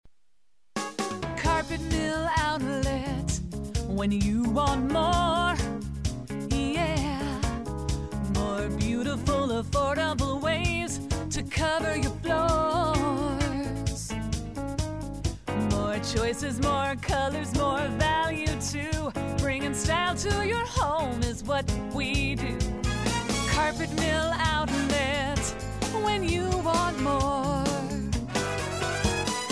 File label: Jingle Full Sing; Carpet Mill Outlet